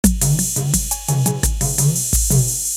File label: 808 with warped tom; esoteric